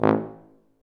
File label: Tuba stacc, F TUBA; Roland L-CDX-03